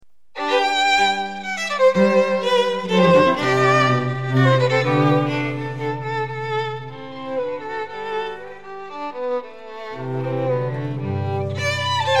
string quartet in G; mozart

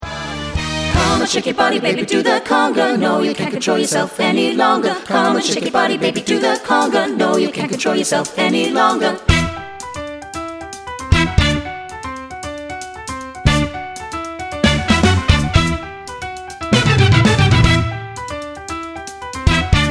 conga

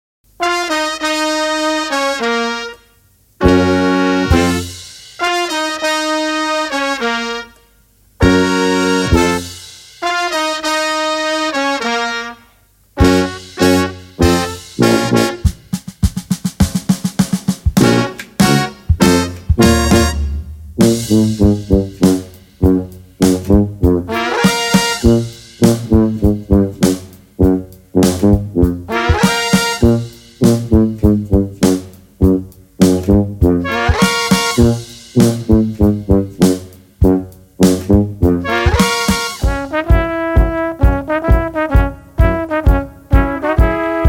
brass; Oktoberfest

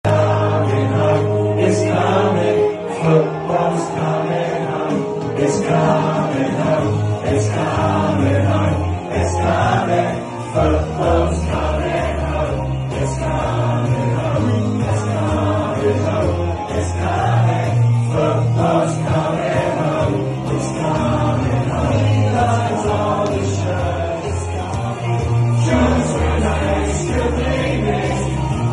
singing live

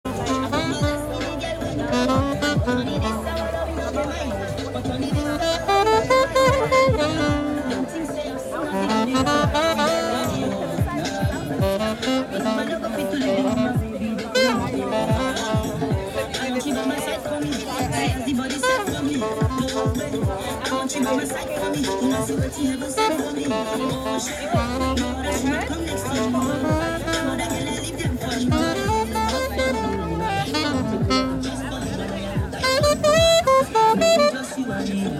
sax

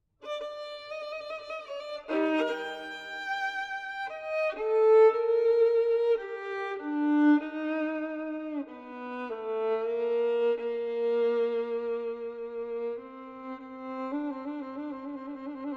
recitativo, violinist

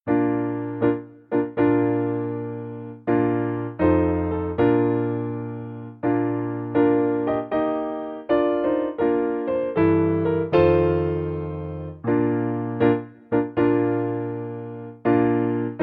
bridal chorus

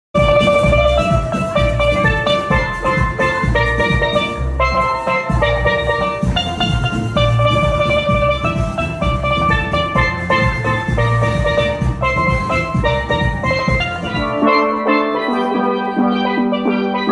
island groove steelband; showreel

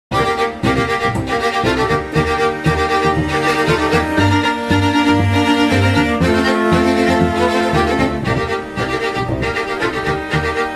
strings

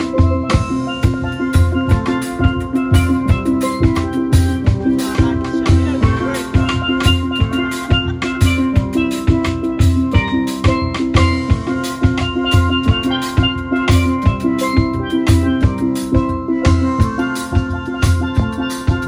steelband 4 piece

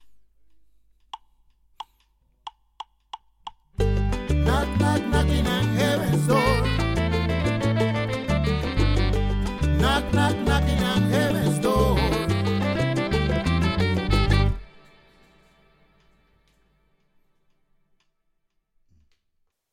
latino

